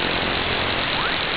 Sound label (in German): rauschh